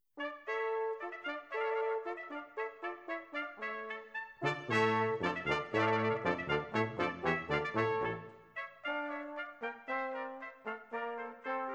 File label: Brass, Bouree; Il pastor fido; Handel